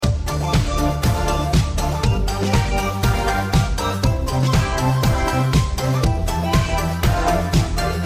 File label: background music